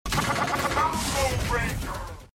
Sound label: blaster combo; games; ringtones